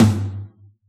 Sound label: pop kit mid tom